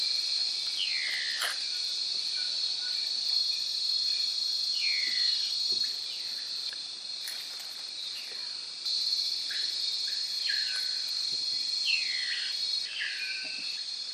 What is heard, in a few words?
Thamnomanes; پرنده بوتهخواهها